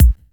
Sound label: crisp kick sample a sharp key